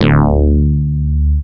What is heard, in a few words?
Brass